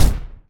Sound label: footstep